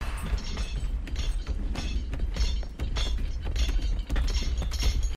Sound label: walking above; spongebob